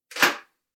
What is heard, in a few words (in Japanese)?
玄関の鍵を開ける音